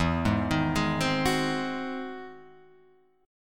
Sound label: E Augmented Major 9th, Guitar, Half Step Down